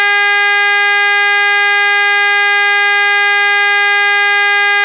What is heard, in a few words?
spiral low, horn